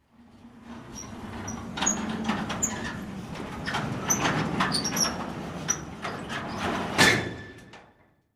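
Metal Gate Open And Slam Shut, Medium close up On Chains W Banging And Squeaks.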